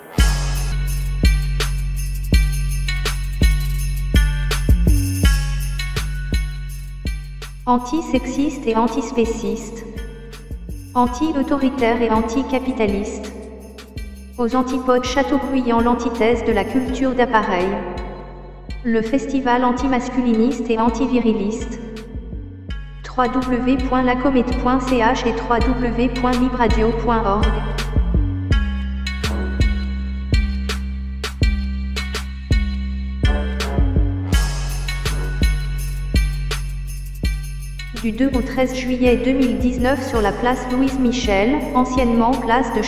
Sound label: Jingle